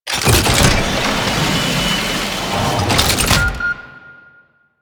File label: Alchemy Transmuter Hit React; Warframe; Entrati